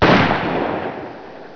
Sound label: RIFLE